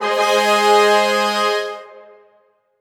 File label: fan fare